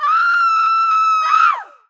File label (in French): Crie